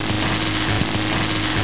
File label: electric